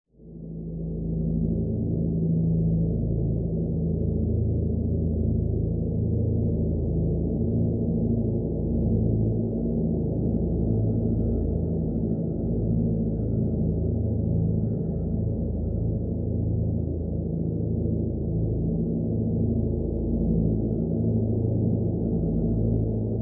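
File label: Drone Wind Farm Simple A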